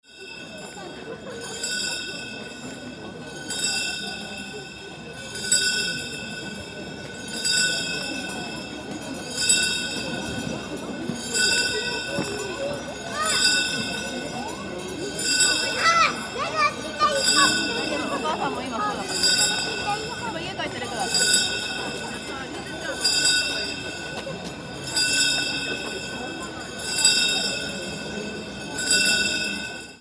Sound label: Bells Gathering